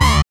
SYN OLD, dance synth; Sound & Vision - Gigapack I CD 2

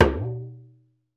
Talking Drums, b1; Retro Sampler; EMU E-III

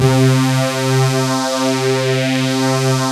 FUZZY LEAD; Pro-Rec - Synthsonic; TRINITY

